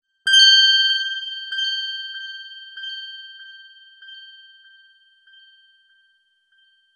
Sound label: Sparkle; 3CXPhone